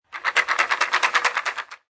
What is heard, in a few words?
Billiard Pool Snooker Rack Up Balls In Frame; Pool balls, snooker balls, billiards balls